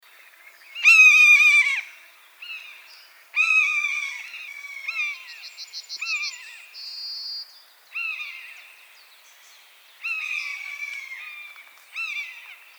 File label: Buzzard Sound